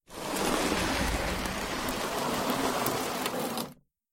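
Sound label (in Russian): zvuki s effektom peremeshcheniia videokamery, video camera movement sound effect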